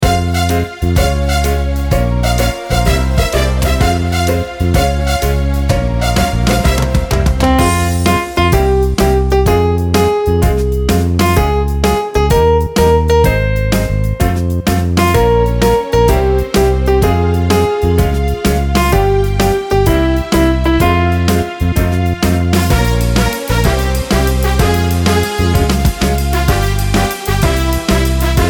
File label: karaoke